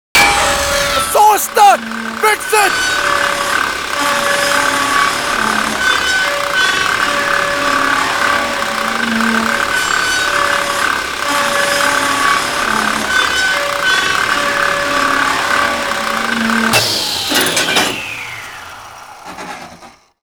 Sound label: ringtone; Payday - The Heist; Wolf